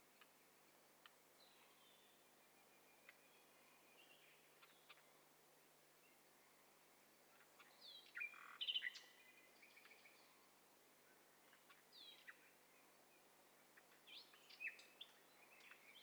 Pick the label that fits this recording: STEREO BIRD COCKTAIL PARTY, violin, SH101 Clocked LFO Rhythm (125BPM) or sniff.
STEREO BIRD COCKTAIL PARTY